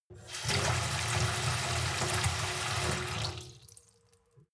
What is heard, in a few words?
sink fill with water medium; industrie-wirtschaft; gastronomie